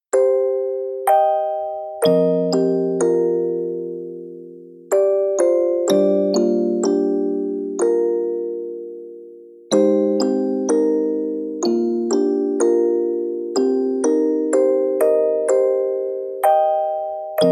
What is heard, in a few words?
Music Box